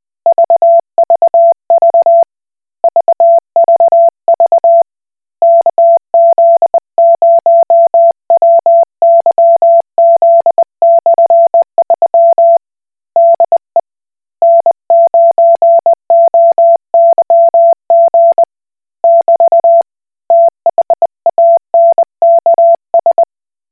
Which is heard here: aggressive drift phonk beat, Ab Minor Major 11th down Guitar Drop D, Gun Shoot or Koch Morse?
Koch Morse